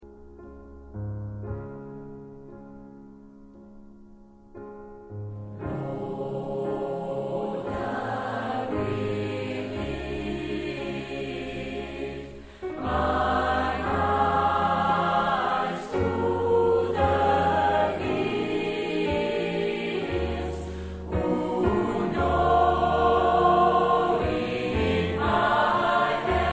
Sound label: Gospel Groove